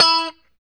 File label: POP E 4, STRAT guitar; Spectrasonics; Hans Zimmer Guitars VOL-1; JC-STRAT POP